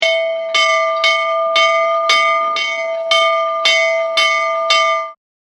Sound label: Fire Bell School Interior; Bell Sound Effects; Bells (Sound Effects:Musical)